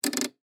Television Set, Technology, Television, Set, Change, Uhf, Channel, Black, And, White, Tv; BlastWave